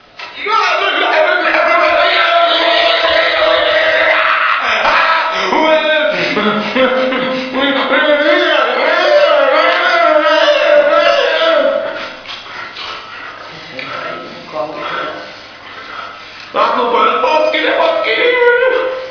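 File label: squeaks, ambience; svencoop; cracklife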